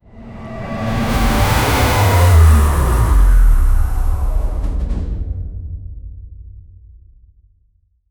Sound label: cinematic fx downer sound